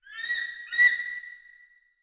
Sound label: canyon eagles; World of Tanks Blitz